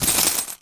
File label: coins bag